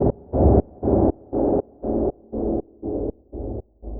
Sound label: Atmos 120, sidechained